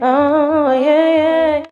"oh yeah" SUNG

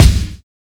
flick, Kicks

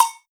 machine, Misc Percussion Ticks

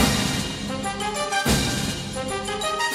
horns, Loops

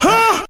huh; Voice; ooh ahh

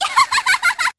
laugh

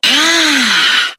"ah", Voice

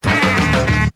Stabs

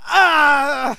"uh" pain; ooh ahh

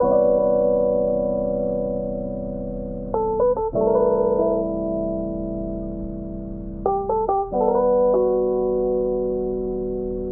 vibes, Loops; Phonky

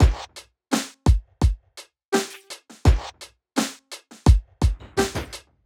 Drum loops, Electro

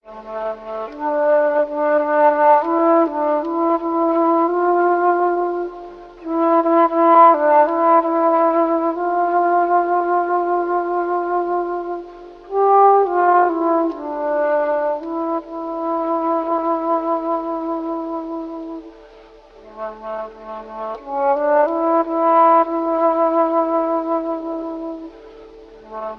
flute, Loops, Sad

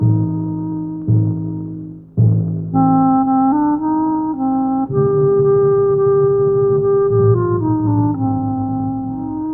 sax, Sad

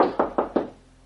knock

